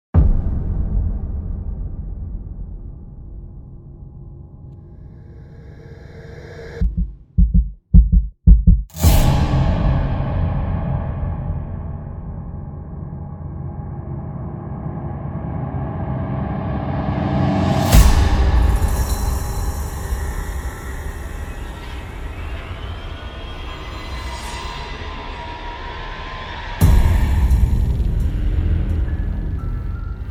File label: trailer music epic hybrid horror sound design; it is coming